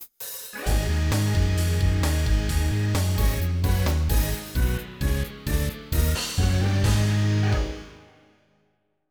BGM Gameover; World Series Baseball 2K1; Dreamcast

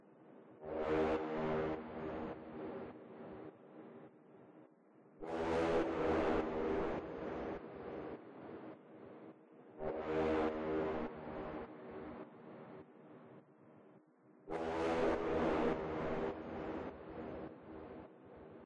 Storm Brass Intro; Solus Project

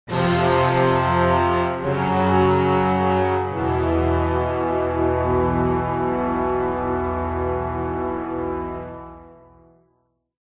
Defeat By Neutral; Mount & Blade

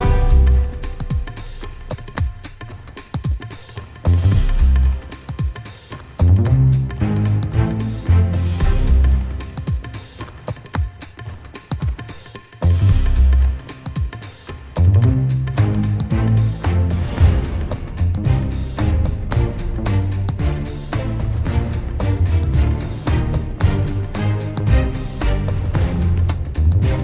Woodburrow Canopy (Attack Dummies)

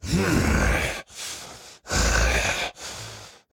Walk Cycle Burns; The Town

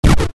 Sound Firework; gamerip; Dream Tower

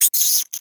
rat drop

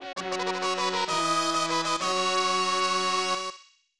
Get Item; Ruin Arm; SNES

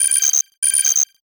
error chip; through abandoned 2 the forest 2016 gamerip pc

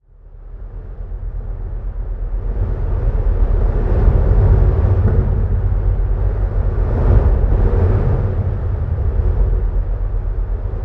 environment temple winds stinger outdoors

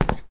Tile long

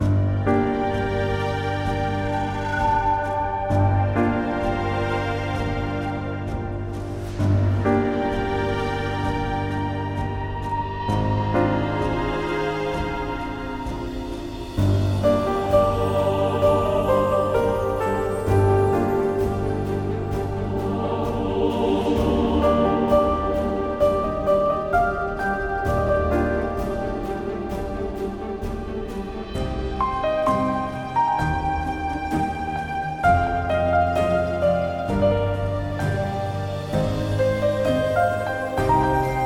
Forest Level Start; Forest Level Area New= Connecting; Death's Door

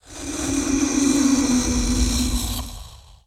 Wraith Death

